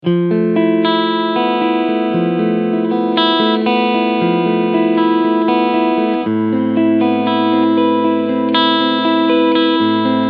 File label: PRS CE 24 Clean Sound Neck PU SC Modus; Dustie Waring